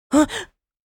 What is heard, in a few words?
Surprise sigh anime boy vocalization